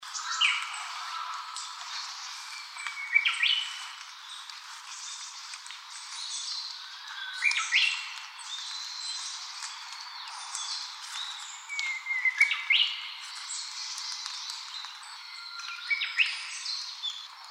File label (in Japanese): uguisu